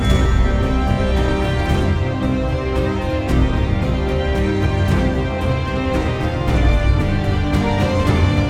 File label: Classical Radius